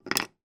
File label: household stove woodburner door key down on surface